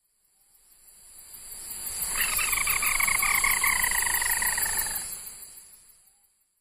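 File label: ruddy-breasted crake, ishigaki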